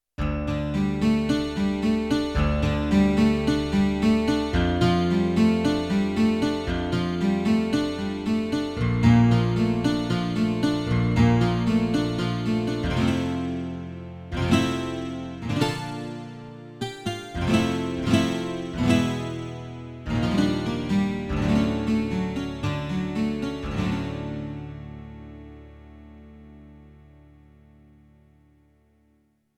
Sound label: 18-String, Acoustic Guitars, Yamaha TX16W